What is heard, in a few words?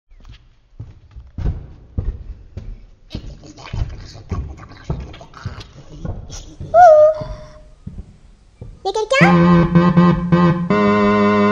jingle; yaquelquun